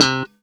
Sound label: c4 staccato, glowly bass